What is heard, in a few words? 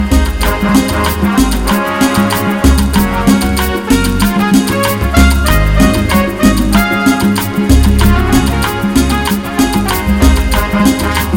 A Happy Cumbia